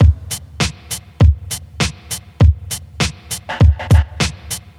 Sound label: 100 bpm hip hop boom bap drum loop D sharp